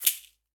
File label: Caxixi Hand; Latin Percussion; Drums; Drum Hits; Shaker; Ableton; Factory Packs